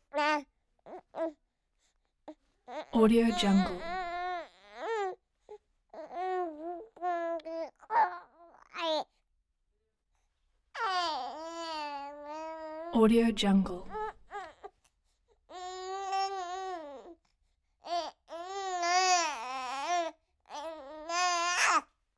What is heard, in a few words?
baby 15 week vox